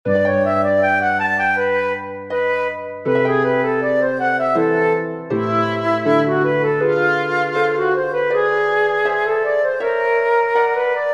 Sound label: verset for flute harp; lefa bure louis james alfred; from organiste moderne